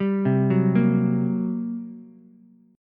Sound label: G Minor Major 7th Double Flat 5th, G, Ukulele; arpeggiated; JGuitar